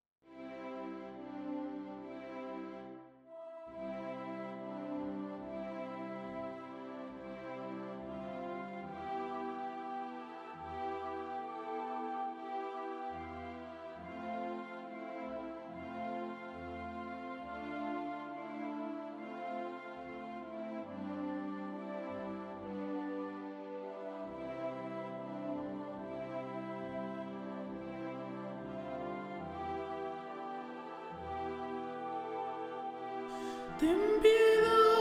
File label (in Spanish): Contralto, cordero de dios